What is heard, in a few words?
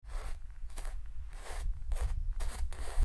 footsteps on snow